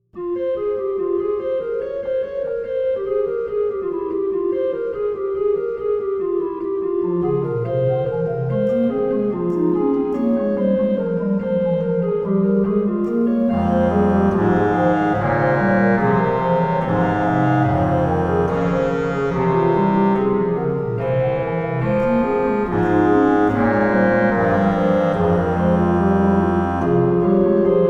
f minor bourdon 8 wet, organ

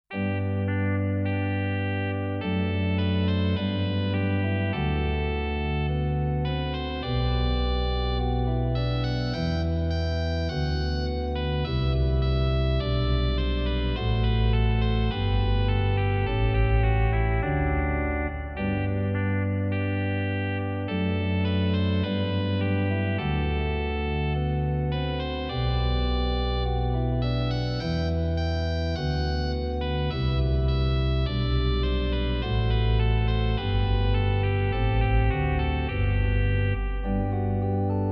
trumpet tune in G, organ; Short and easy Trumpet Tune for organ. Trompette d'orgue, piece courte et facile

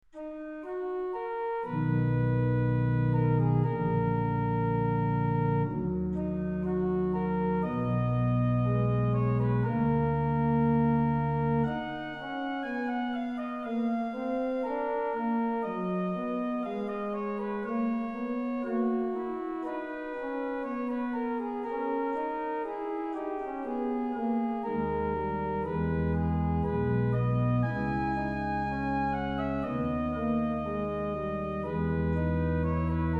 Prelude D min, organ; Little Organ Book

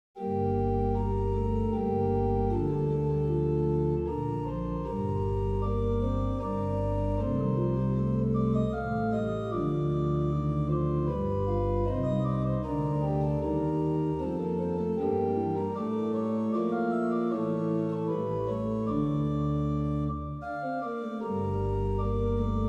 mini organ book in G minor; Mostoles

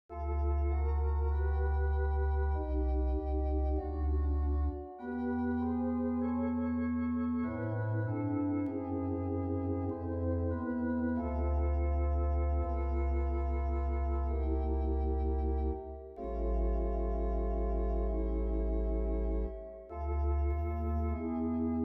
tremulated prayer, organ; played on swell with tremulant, demo for St-John the Evangelist sampleset for Hauptwerk. Easy to play

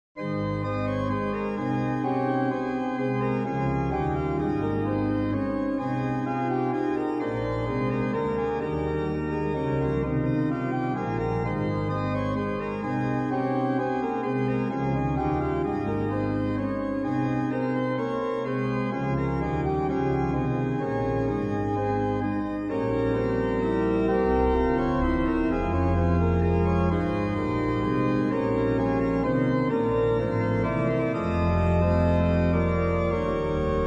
softly and tenderly reverb, organ

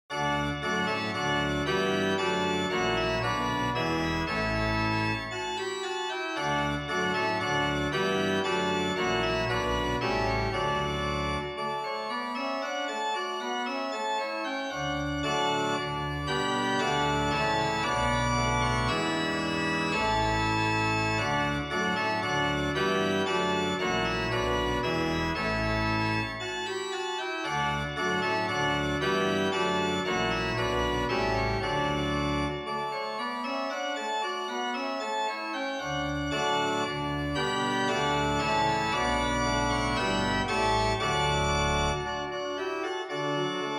Tutti, organ; Saint-John

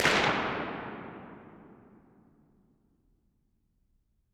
chicago no delay, organ

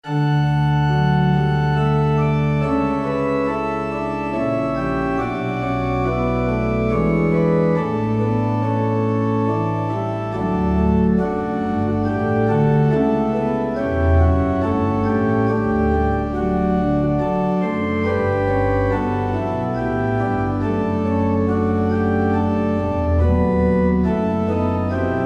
trumpet in D organ solo for trumpet training

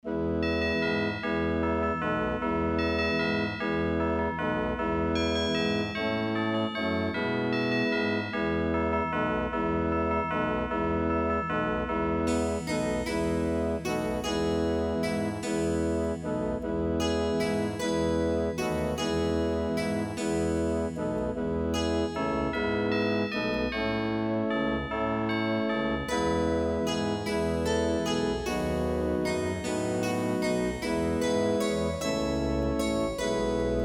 orchestra

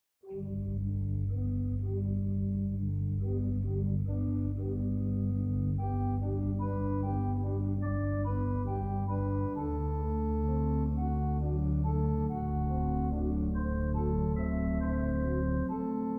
saint-pierre de sales marennes, organ

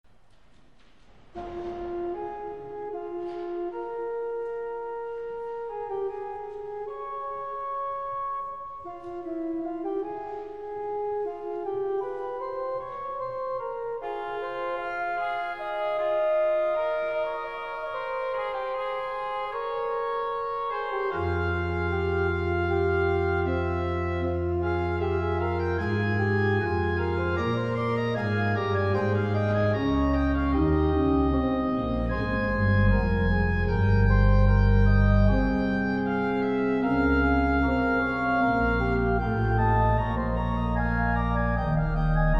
adagio ambiance, organ; adagio suite ziegler

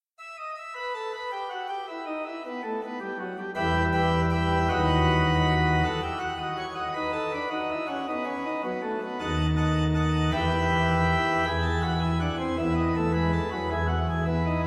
toccata Emin, organ; inachevйe

